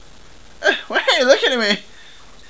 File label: eww